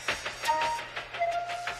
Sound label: Ding